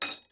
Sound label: Putting Spoon On Plate